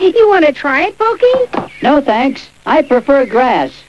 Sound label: grass